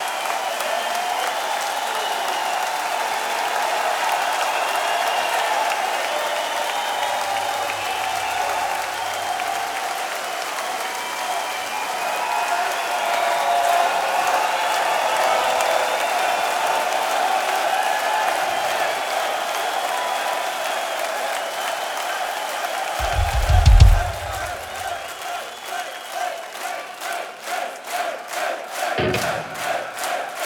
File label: Applause